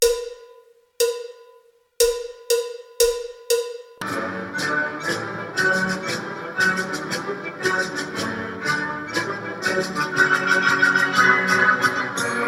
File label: F Key